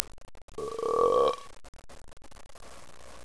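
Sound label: burp, authentic belch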